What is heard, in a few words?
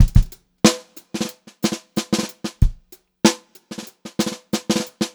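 groovy variation, Funk-Groove Drumloops; USB Soundscan vol.38; AKAI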